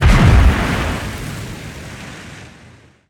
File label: damage hits explosions, Warships